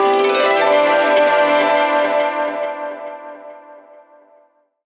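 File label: harp TOUCH FX; Rawcutz - Nu Forms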